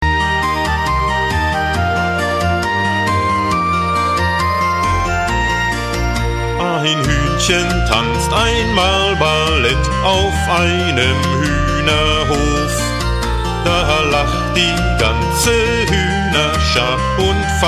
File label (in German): kinderlieder vocal